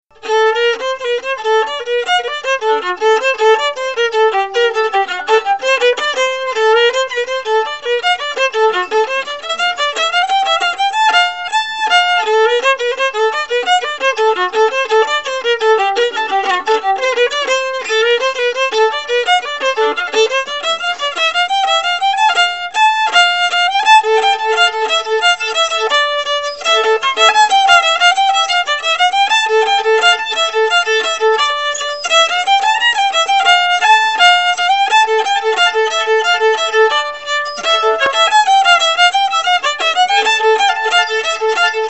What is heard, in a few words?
Saratoga Hornpipe, fiddle